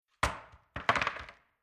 Wooden Pallet Dropped On Ground Concrete Falls On Side Bounces; Tom Hutchings